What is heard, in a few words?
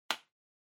Bingo Card Punch